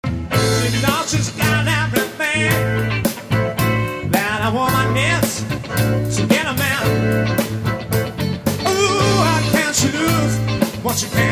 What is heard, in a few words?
BandDemo